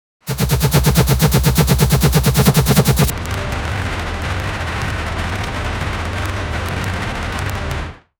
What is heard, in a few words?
intense medieval fantasy, battle cry